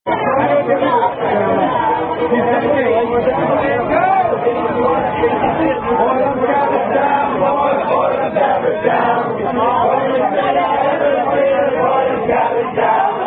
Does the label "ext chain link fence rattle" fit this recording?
No